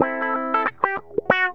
GTR, Funky; Zero-G; Funky Elements

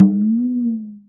GLISSENDO; Univers Sons - Raricussions

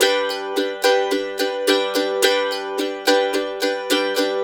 RIFF, Blues Guitar Samples; ILIO - Fretworks

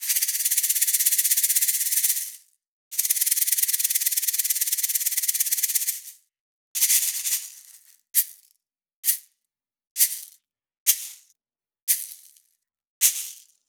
Raw Perc Shaker; Sliced

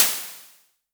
Crashes; LIMAT Div Dept; Drums

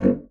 bassoon G1; C BASSOON; Xsample VOL-6

